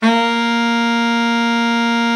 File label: BARITONE SAX; Best Service; Killer Horns